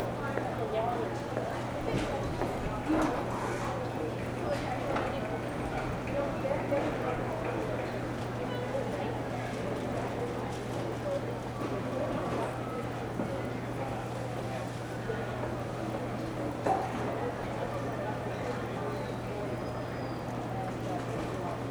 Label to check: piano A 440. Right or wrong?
Wrong